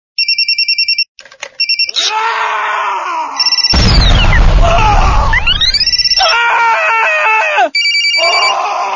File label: Nokia sms crazy